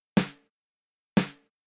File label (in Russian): snare, Ритмическая фигура малого барабана 1; «рабочий», или «малый» барабан, или snare; Он обычно ставится во 2-й и 4-й доле